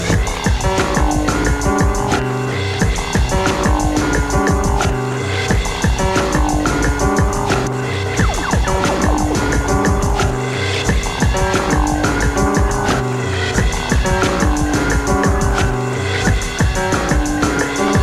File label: instrumental mix